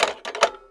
tel polozeni; Mafie 1

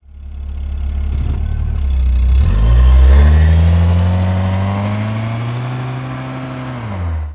auto rozjezd; Mafie 1

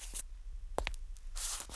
preslap; Mafie 1

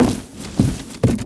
krabice; Mafie 1

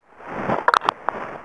sluchatko; Mafie 1

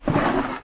krabice; Mafie 1

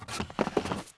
vstal dřevo; Mafie 1

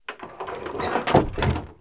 korba; Mafie 1

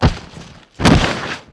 na kolena; Mafie 1

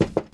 cedulka; Mafie 1

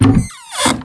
dvere otevreni; Mafie 1

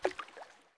cigareta; Mafie 1

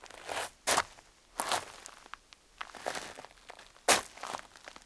kroky; Mafie 1

